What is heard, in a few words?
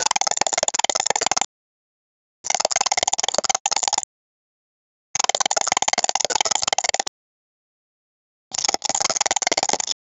sound effects for a candy